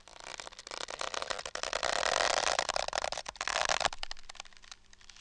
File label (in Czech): aerostat; Mafie 1